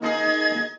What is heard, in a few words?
sharper, Synths; Notes